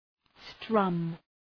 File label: strum